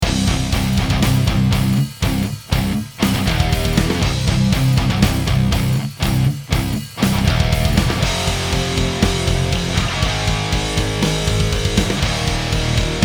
amp; peters mako mesa krank splawn

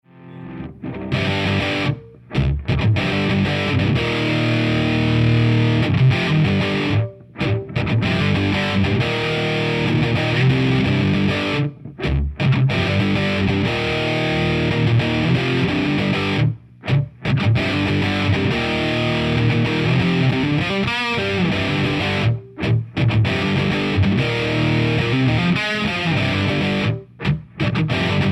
mak4 crunch; vh4 ch 3

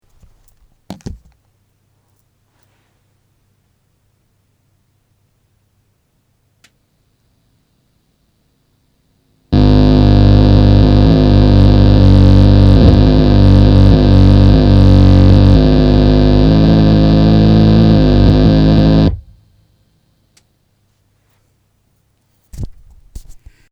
diezel herbert buzzing; makes a buzzing noise

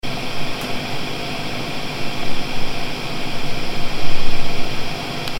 clean, Rebel30 - Hissing

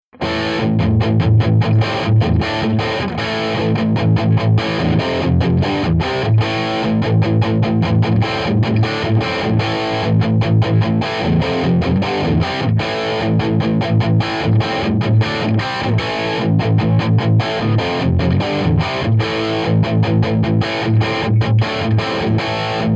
jmp 1960 md421, harsh marshall tone

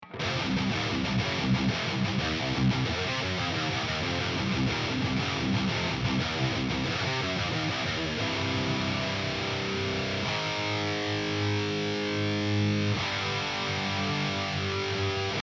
amp; huge amp shootout

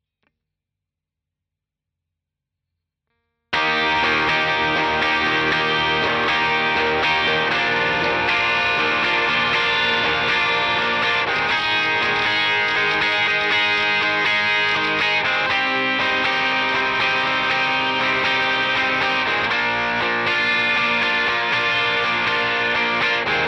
diezel; diezel einstein speaker problem

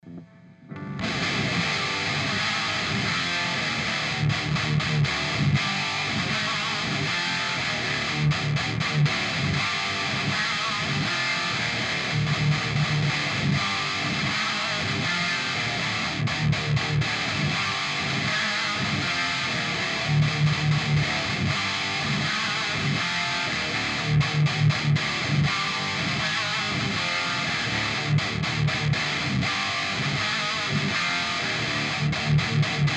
carvin v3 tone

